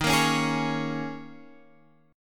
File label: Ab Suspended 2nd Suspended 4th Eb; Absus2sus4/Eb strummed